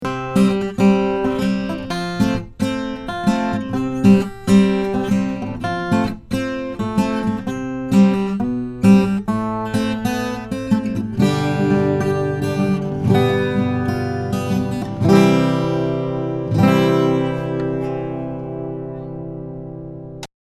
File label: Takamine, guitar